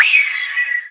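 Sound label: FILTER right, Disjointed Textures; USB Soundscan vol.33; AKAI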